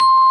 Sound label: VIBE